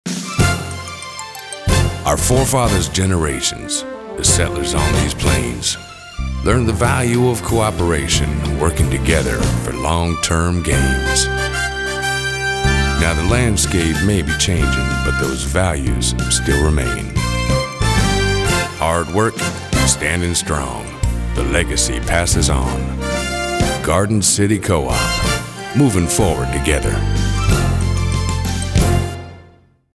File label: jingle